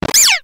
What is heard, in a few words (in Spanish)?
Grito; Sprigatito